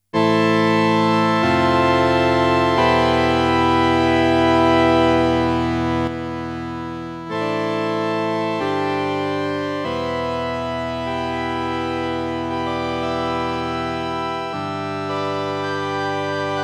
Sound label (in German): Casio MT 400V Organ Pipe Organ mit Stereo Chorus